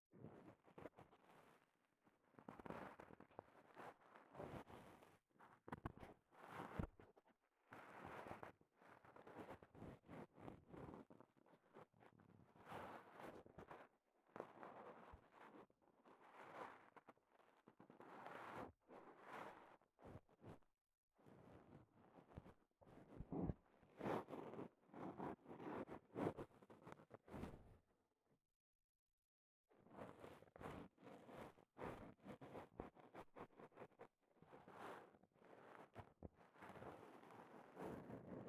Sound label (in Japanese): 綿棒 奥, 耳かきに関する音; KU100